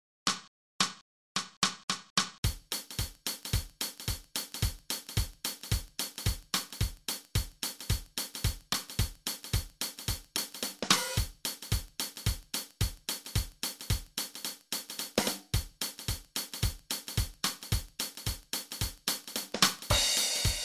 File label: FORWARD MOMENTUM DRUM TRACK 220